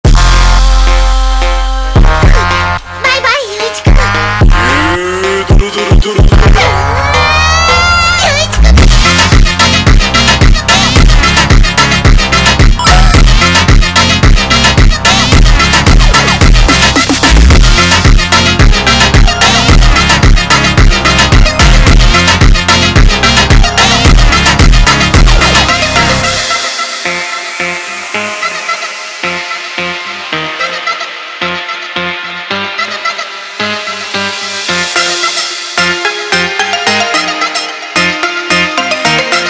brazilian Phonk

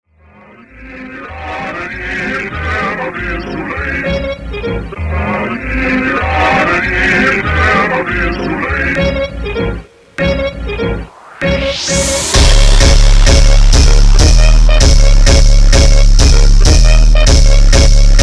Cartoon Cat Funk slowed

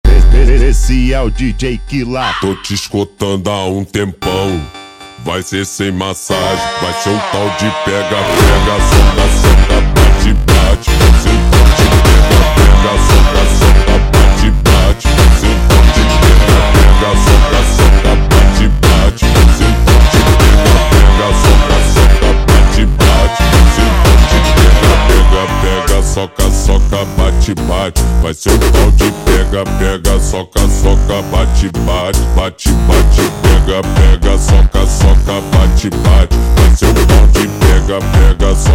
slowed, فانک

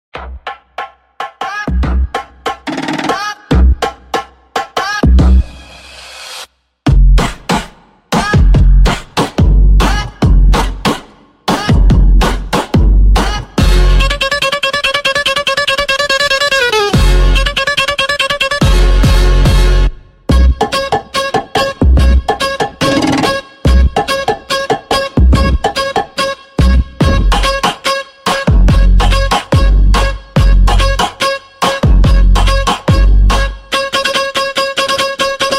Airtel Funk super Sped Up

